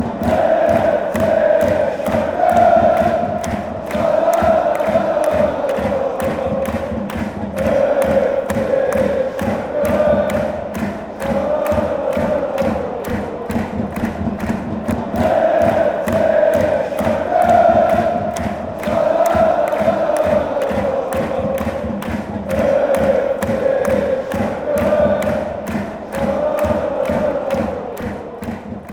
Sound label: schalalala, fanchants; fc schalke